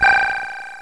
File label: bonus; Games.Rick Dangerous